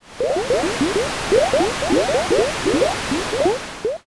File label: boiling